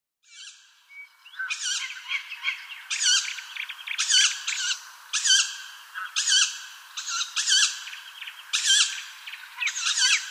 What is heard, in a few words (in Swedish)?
Kattuggleungar